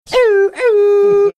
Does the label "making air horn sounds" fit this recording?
Yes